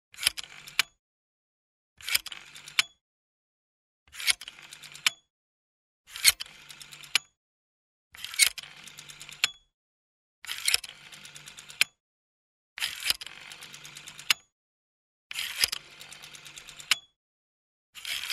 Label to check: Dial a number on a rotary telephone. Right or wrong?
Right